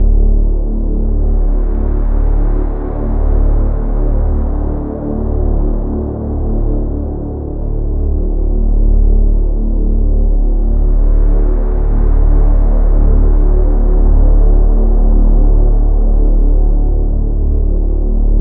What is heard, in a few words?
drone; Vrml2Sourcebook; Chapter27 Sensing Visibility Proximity Collision